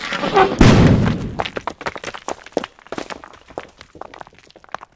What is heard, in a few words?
trunk, enemy, combat; baurg